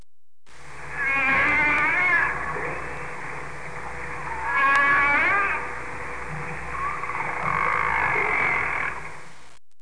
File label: whale